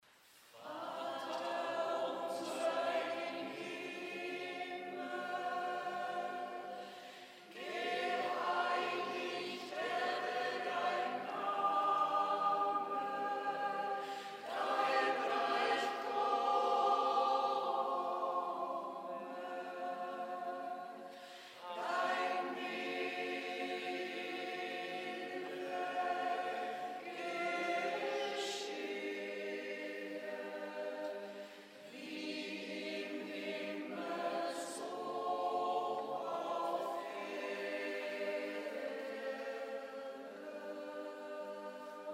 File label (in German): Vater unser Bulgarien